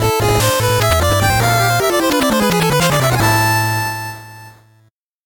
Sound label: 8bit jingle normal